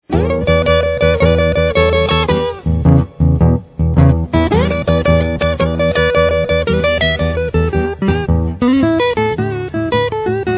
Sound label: blues guitar